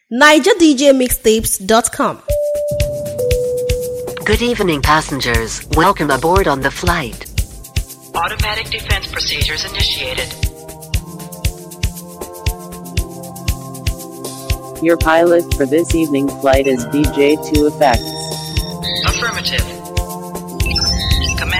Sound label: Amapiano 2025 Mixtape, Afrobeat; South Africa; Pure Amapiano SA Mix